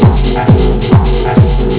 tekkno

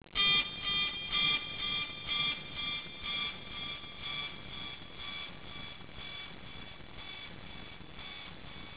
bend mobile

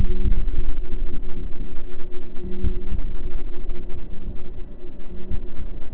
glass

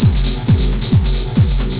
tekkno eq

